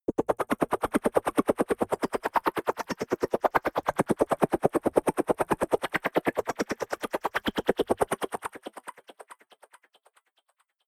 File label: delay gater